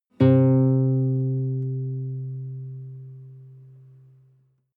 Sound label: nylon guitar single note